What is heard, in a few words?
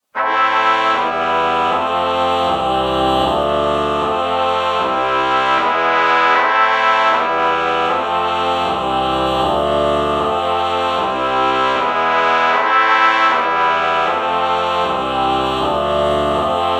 ah trumpet chord morph